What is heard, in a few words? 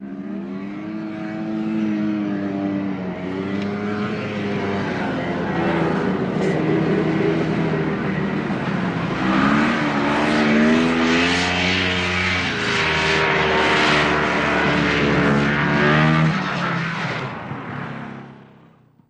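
Snowmobile Drive Around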